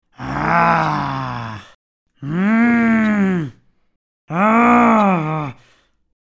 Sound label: angry man whirr